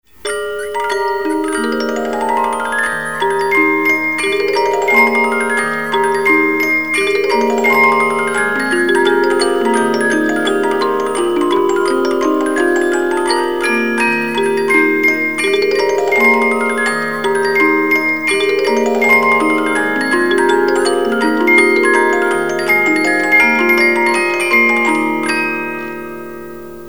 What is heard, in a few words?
Nutcracker Suite March, 50 Note music box